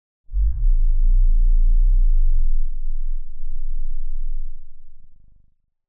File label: Giant Floor Panel Assemble Final Lift LFE; Boomtown; Sideways Town; Titanfall 2